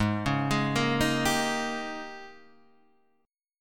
Ab Major 7th Suspended 2nd Suspended 4th Ab, down, Guitar, Standard; arpeggiated; JGuitar